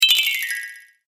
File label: Falling Magic Sound; fiction sound effects